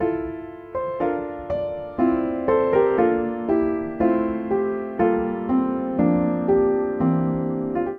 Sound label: Phrase F Major 120 bpm, Upright Piano; MIDI Clips; Just Like Home; Upright Piano by Spitfire Audio; Ableton; Factory Packs